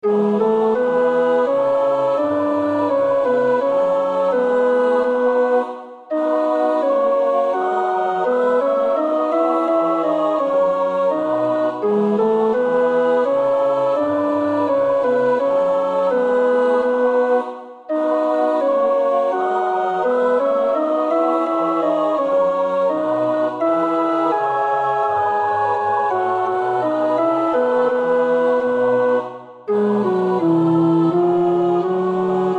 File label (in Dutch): tenor, koorpartij; Bach (Johann Sebastiaan); BWV 245 Johannes Passion